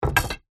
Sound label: sport pool cue set down on pool table